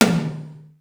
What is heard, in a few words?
hi can; sr16 tom